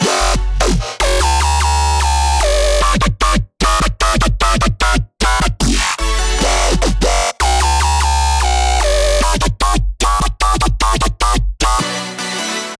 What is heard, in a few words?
Raptor Drop Loop 150 BPM F# min, Dubstep, Bass Loops; Synths; Cymatics; Dubstep Starter Pack